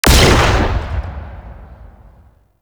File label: Modular Secondary Barrel Fire, Weapons; SU Modular Guns; Barrels